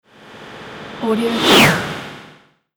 swoosh

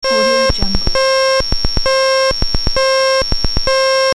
alerting space transmission; sound ideas

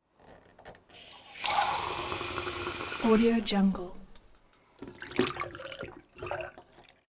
water faucet filling plastic cup pour into sink

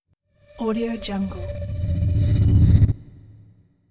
stuttering pulsing thin reverse stinger

